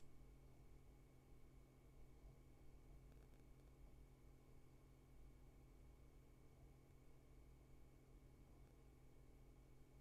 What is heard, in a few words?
room tone